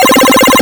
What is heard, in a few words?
M-Audio Venom 8Bit Tremo C4